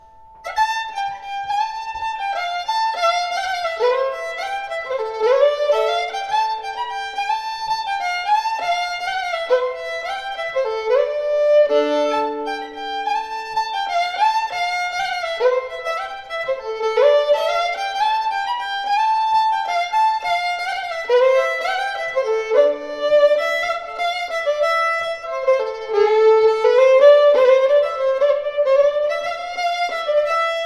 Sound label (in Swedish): Malungslat